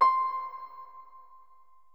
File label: harp